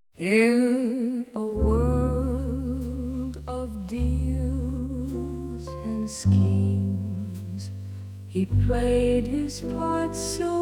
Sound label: Smooth Serenade jazz